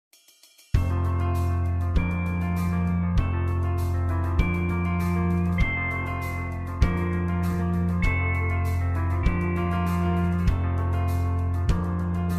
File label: (F) No Backing Vocals